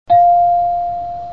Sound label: ringtone